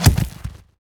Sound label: arrow dirt